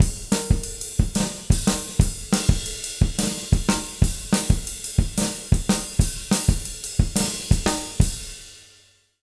Jazz Latin Drumloops; USB Soundscan vol.08; AKAI

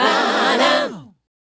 Ba Da, Vocal Lix

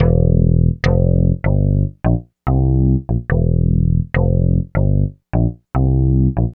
Bass, Synth Bass Loops

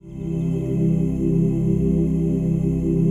PAD, Dance Mega Synth

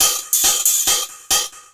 percussion loops, 138, Techno

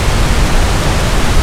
pink noise C3; Big Fish Audio; Synth City

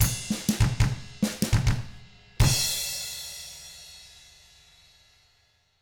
FUNKY, Drums; ILIO; Double Platinum Drums 1; 99 FUNKY 2

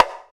Bongo, Roland BK-5; Percussion

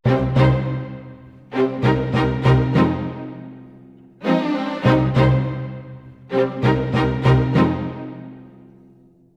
90 c min full mix, Orchestral arrangements; PowerFX - Downtown Orchestra

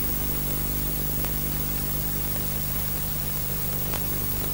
Line Noise

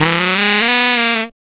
brass riffs & licks; Total Drum&Bass

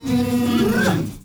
MALE WAR, Heart of Africa, shout; Spectrasonics; Heart of Africa 1; CH. SHOUT2

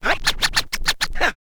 scratch kit, vinyl

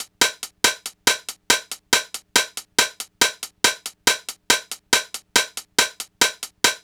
NRG Cymbal

